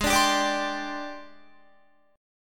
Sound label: Ab Major 7th Flat 5th Ab, down, Guitar; AbM7b5 strummed